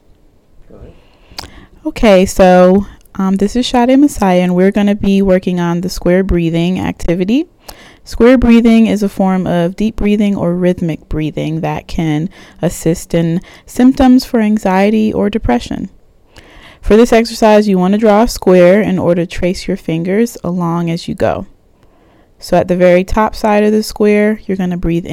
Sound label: square breathing